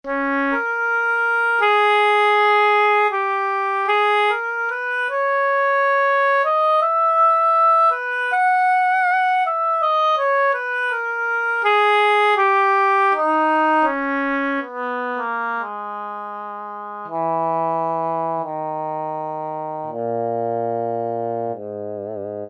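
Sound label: Oboe Breath; Brass And Woodwinds; Roland L-CDX-03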